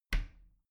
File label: bathroom cabinet creak close soft; objekte; tueren